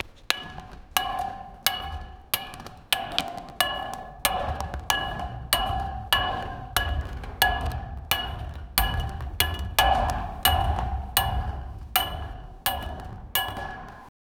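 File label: player with a metal foot, footsteps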